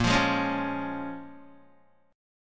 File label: Bb-Minor 7th-Bb Guitar; Bbm7 strummed